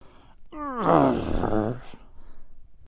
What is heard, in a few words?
angry